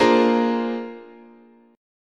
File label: A sharp 9th, guitar chord